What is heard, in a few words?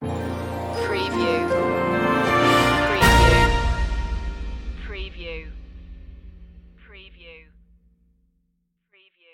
storming cinema ident stinger